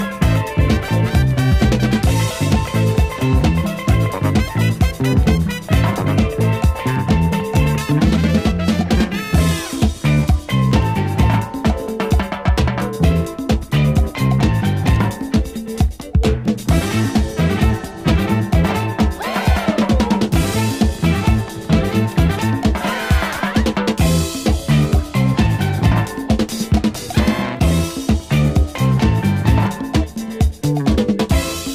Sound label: Steelband Disco (disco dub)